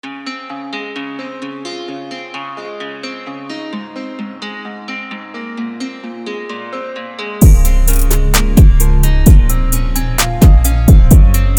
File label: type beat